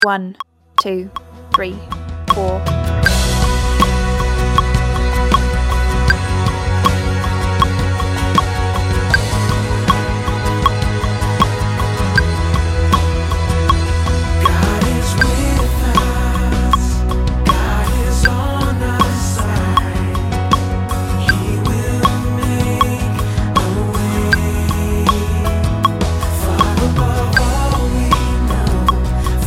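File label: electric guitar track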